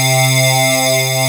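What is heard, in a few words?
synth C4; Best Service Gigapack 1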